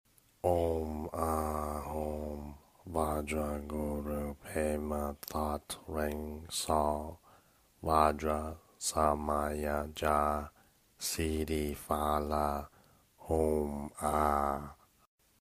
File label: Vajra Guru Skull Garland Sanskrit Mantra